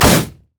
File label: reckless swing; olaf